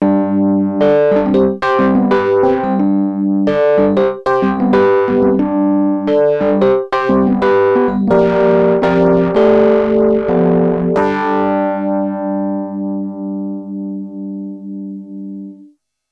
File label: Elektron Analog Heat, Enhancement Filter LFO Rhodes